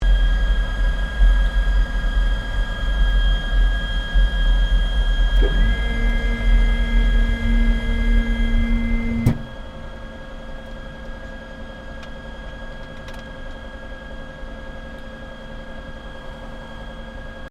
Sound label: AIRCRAFT GLOSTER METEOR F MK8 CANOPY CLOSE INTERIOR, Gloster Meteor fighter jet; Airplanes